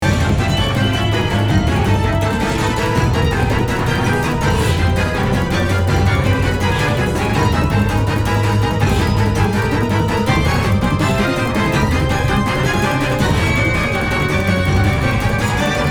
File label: Action Loop